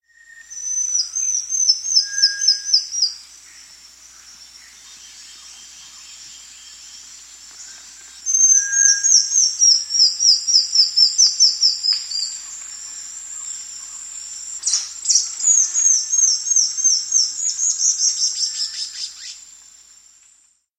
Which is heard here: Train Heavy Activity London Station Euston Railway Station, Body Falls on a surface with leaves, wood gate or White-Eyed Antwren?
White-Eyed Antwren